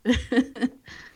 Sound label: chuckle